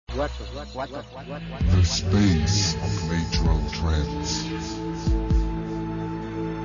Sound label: natural trance